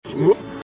oomf; reverse

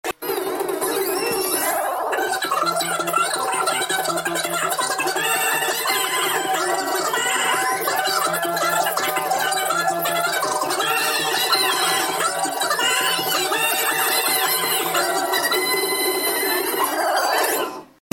chant; catdog

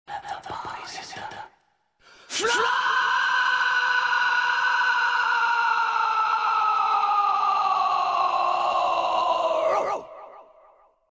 floor; cave

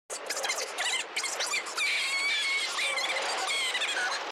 burning money

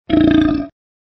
burp

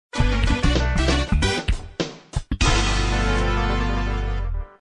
course clear; reversevape

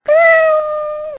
cat; reversevape

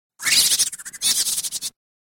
laugh

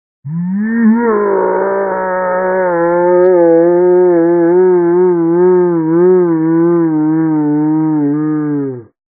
fall; vapesquared

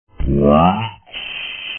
brakes; vapesquared

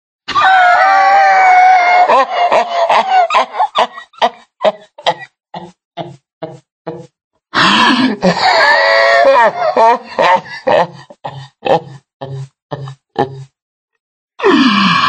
laugh

